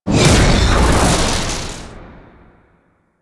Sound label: Hand Portal Start; Deadlock Protocol